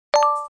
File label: notif